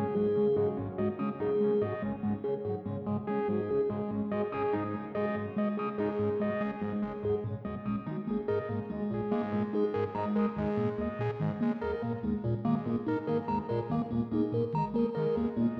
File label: arpeggio; 0°N 0°W